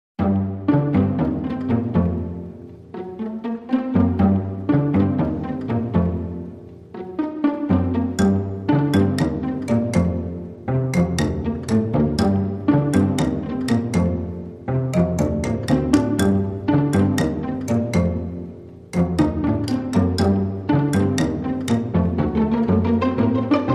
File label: Pizzicato Curiosities No Perc